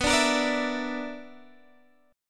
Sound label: B-Minor Major 7th Double Flat 5th B down Guitar Drop D